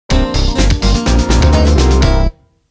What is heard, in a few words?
ball gadget victory sound; Spyro A Hero's Tail